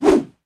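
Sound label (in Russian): свистящий звук замаха саблей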